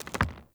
Foley Movement; Characters; Enemy; Mockeries

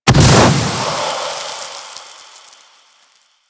Ghost Death Explosion; Warframe; Quests; Chimera Prologue

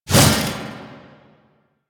Mace Whoosh; Warframe; Duviri; Duviri Dax; Weapons; Duviri Mace And Shield

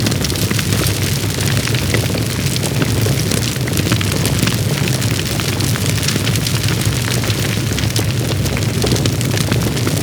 Fire Blast Vortex; Warframe; Ember

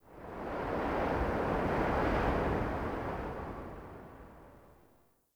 Fort Wind Gust Soft Buffet Airy; Ambience

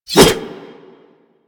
Tonfa Whoosh; Warframe; Tenno

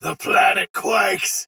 attack heavy soldier sword agent male; Dialog; Barks; Battle; Melee; Sinecure

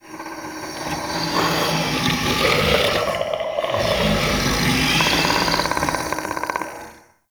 Male Vocal Idle; Characters; Enemy; Vocals; Mockeries

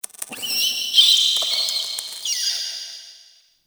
Rat Vocal, Crypt Ambience; One Offs; Champion Crypt

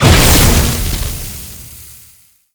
Enemy Spawn; Warframe; Man In The Wall; Enemy Gameplay

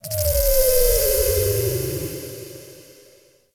Landscape Textures, Ambience; Venus; Objective Rooms

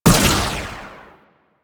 Ball Drop; Warframe; Missions; Tenno Ball

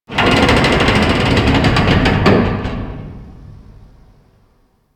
Derelict Metal Creak; Warframe; Orokin Derelict

